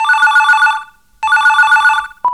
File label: FX; AMG Megabass REMIX! VOL-2